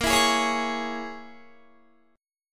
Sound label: A sharp Minor 7th Flat 5th A sharp, Guitar; 18,19,18,18,20,20; 4E,4A,5D,5G,5C,6F; strummed